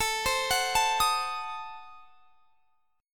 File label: A Diminished 7th A; arpeggiated; Adim7